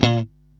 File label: A#2, funky guitar